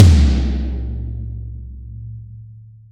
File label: TOMS, Real Mega Drums